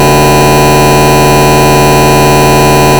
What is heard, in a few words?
Pwm